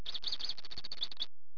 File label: goldfinch